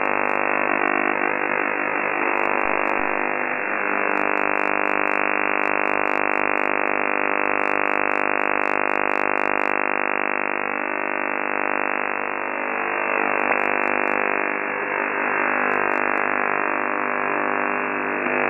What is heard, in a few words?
Turkish OTH Radar 50 sweeps-sec